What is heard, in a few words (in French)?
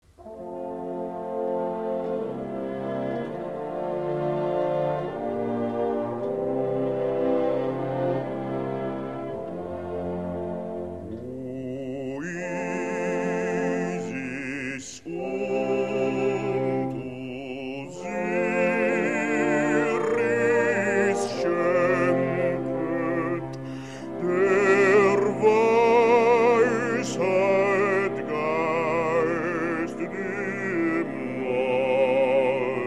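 basse Flûte; basse (Mozart, flûte enchantée)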